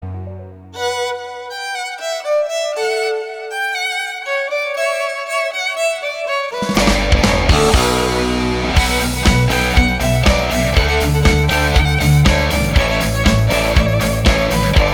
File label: werben instrumental